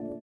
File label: VIBRA; Spectrasonics; Metamorphosis